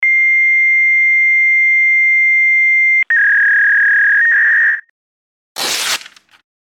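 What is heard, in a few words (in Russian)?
fax starts, paper torn off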